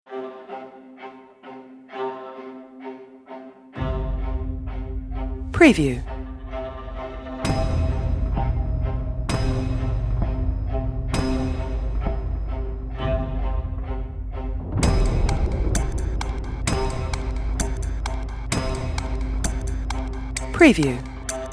Cinematic Scary Horror Dark Fearful Clock-Ticking Music